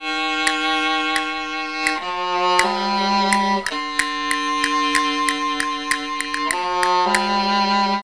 Castanets Violin; speed 0.83; tsmtoolbox

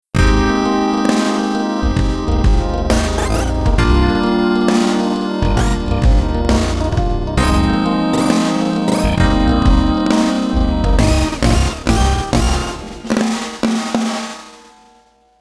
Pop; speed 0.56; tsmtoolbox; wsola

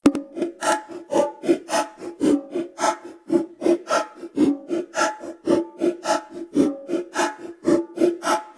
Bongo; speed 0.56; tsmtoolbox; phasevocoder

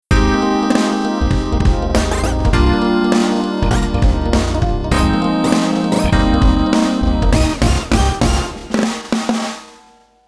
Pop; speed 0.83; tsmtoolbox; wsola

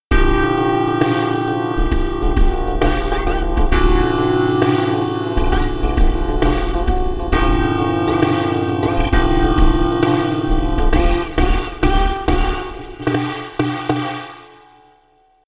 Pop ola, speed 0.56; tsmtoolbox

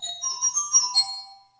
Glockenspiel; tsmtoolbox; phasevocoder

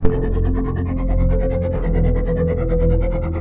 Synth Poly; tsmtoolbox; phasevocoder

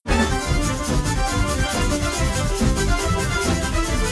Jazz; tsmtoolbox; phasevocoder

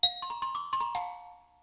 Glockenspiel; tsmtoolbox

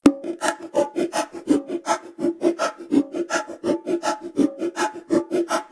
Bongo; speed 0.83; tsmtoolbox; phasevocoder

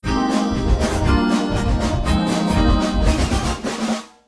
Pop; tsmtoolbox; phasevocoder

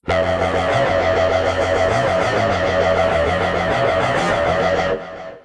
Synth Mono; speed 0.83; tsmtoolbox; phasevocoder

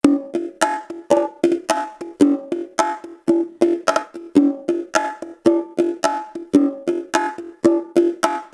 Bongo wsola, speed 0.56; tsmtoolbox

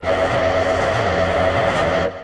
Synth Mono; tsmtoolbox; phasevocoder

